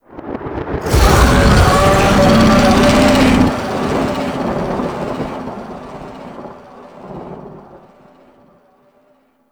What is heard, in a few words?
Infested Micro Planet Moon Monster Bellow; Warframe; Microplanet Sun Monster